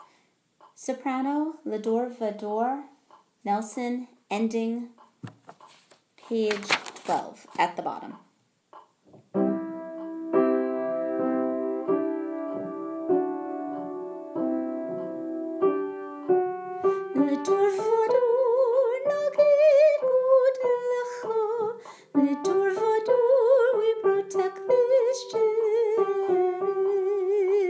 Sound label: Ending Sop